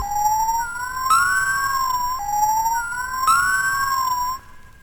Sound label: FX; AMG Megabass REMIX! VOL-2